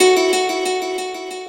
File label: guitar